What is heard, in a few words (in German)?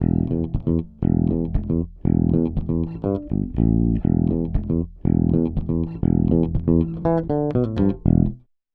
SSL 611 E DYN E Bass ohne Kompressor